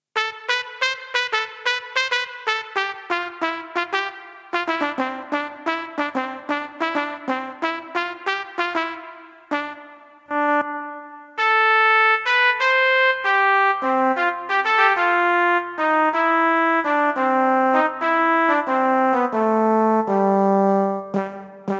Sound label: Lotsahorns; Bank 2 card A